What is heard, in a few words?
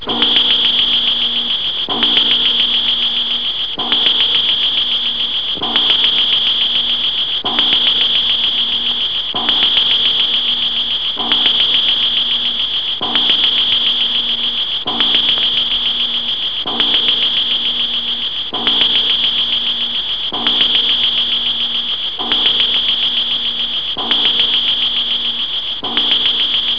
Martian; The Epic Collection 3; Epic Marketing; muzak samples